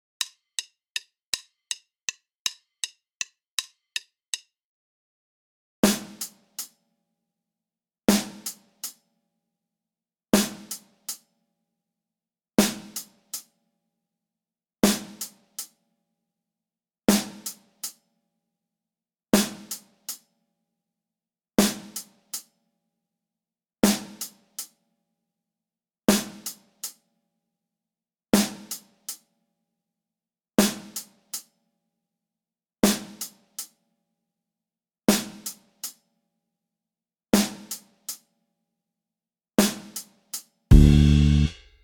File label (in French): decalage triolets exercice batterie tempo 080